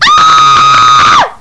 SCREAM

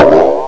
BOING!

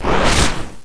WHIZZLE

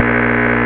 STALL

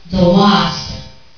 WASP

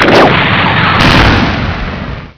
FIRE; VERITECH

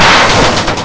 smash

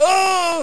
die; ARRAKIS

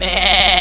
SHEEP

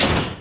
CRASH; MAXOVER

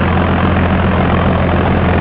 JET

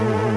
antigrav

